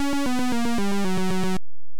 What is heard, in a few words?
Title Beeper; Nebulus